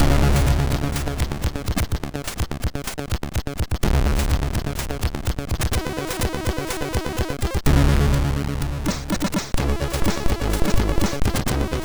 MOS6581R3, SID; Xract; Fozzie

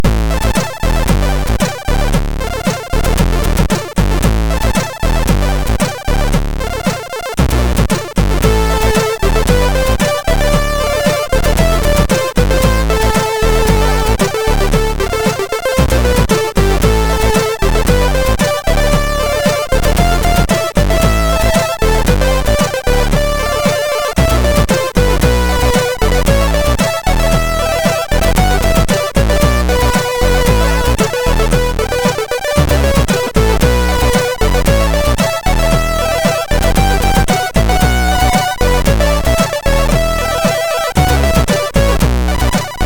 Intro Song, MOS6581R4; DMC

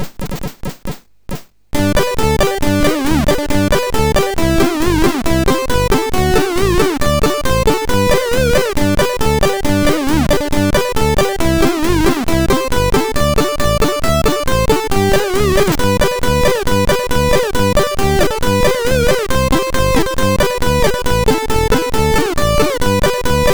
Humppa Bells, MOS6581R2

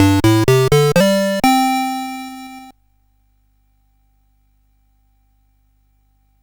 CSG8580

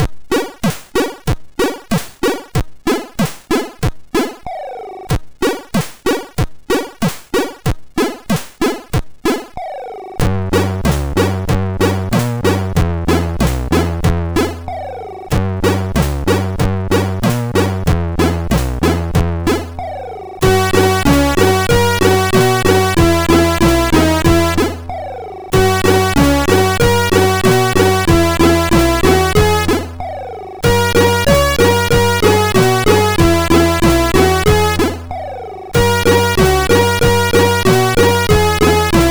Short Ska, MOS6581R2

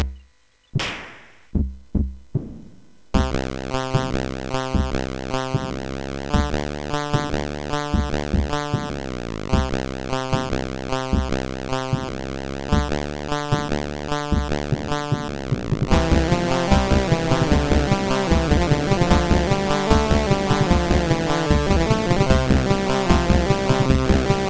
C-64 Tune, MOS6581R4; hvsc

